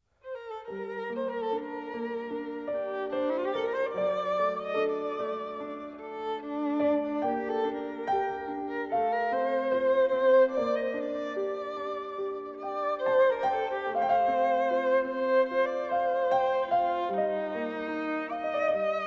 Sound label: Instrumental